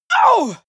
female combat hit soft

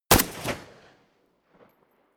rifle ak-47 exterior fire single close; comedy cartoon; pistolen gewehre; Pistols and guns

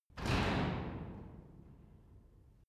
garage door close short soft

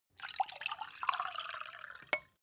glass pour; soundpool

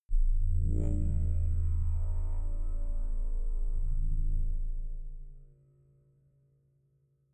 crushed bass growl soft

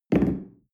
espresso place tablecloth

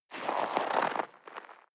step snow walk single

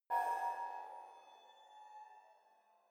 sci-fi facility ai awake damaged; maschinen; signale; soundpool

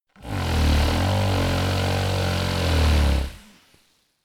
monster snore heavy low; comedy-cartoon; koerpergeraeusche

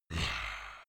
beast growl low, comedy cartoon; kreaturen; soundpool

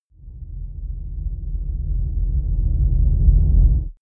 deep noise rumble short; whooshes rises; risers

